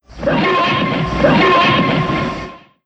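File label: raptor attack; sinfar haks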